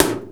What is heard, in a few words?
TOM, drum